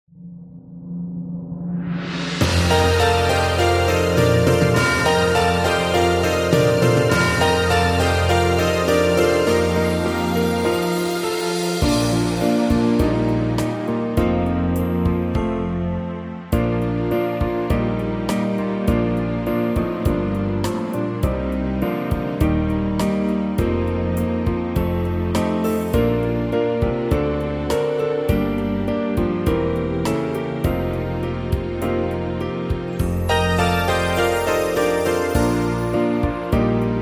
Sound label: bells ring out backing; choir